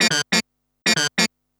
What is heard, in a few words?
TECHNO SYNTH 140; Keys